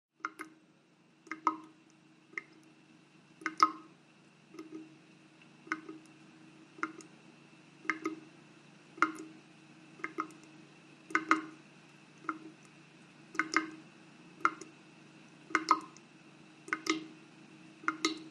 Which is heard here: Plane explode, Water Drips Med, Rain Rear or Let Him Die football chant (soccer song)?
Water Drips Med